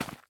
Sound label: Footstep Dirt